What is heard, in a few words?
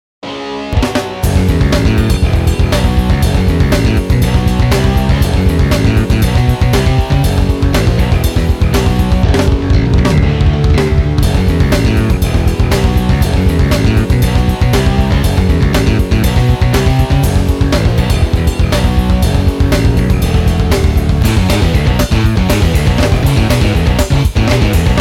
Progressive Rock